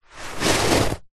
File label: hand movement scrape carpet; zapsplat; foley